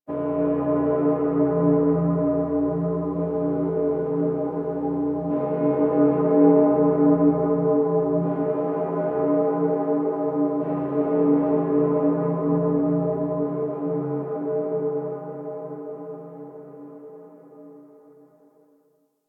Church Bell Ring Clear; Dagath; Warframe; Ambience; Clan Dojo; Dojo Misc

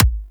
Weapon Punch Pistol; Weapon Punch Layers

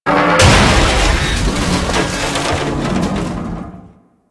Asteroid Winch Junk Explosion; Warframe; Ambience; Grineer Asteroid; One Offs

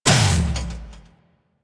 Mech Run Stop; Nechro

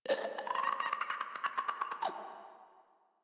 Infested Runner Patrol; Warframe; Runners

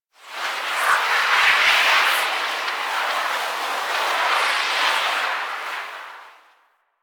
Void Storm Wind Gust; Warframe

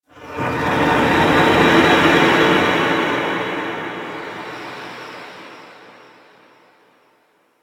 Random, Ambience Interior; Warframe; Orokin

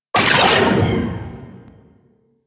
Railgun Charged Mech; Weapons; Warframe; Archwing

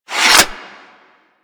Hammer Sheath B, Foley; Warframe; Weapons

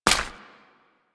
Step Dirt, footsteps

